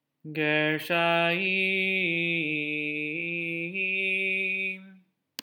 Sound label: Torah Trop Pattern